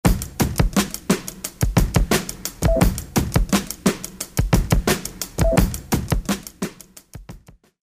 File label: beat